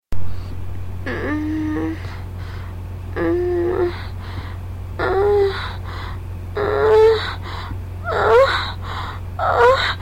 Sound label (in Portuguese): gemido dengoso, Mulher Solta Um Ge; Mulher Solta Um Gemido Dengoso Durante Orgasmo